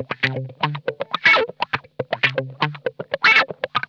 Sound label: COARSE WAH, Funk Guitar; Zero G - Funk Guitar